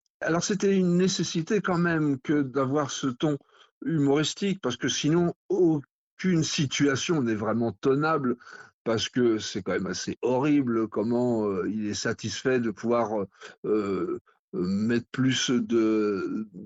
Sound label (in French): Riff humour; kernok le pirate